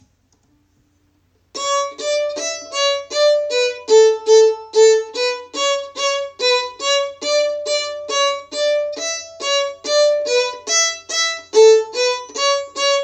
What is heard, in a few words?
Perpetual Melody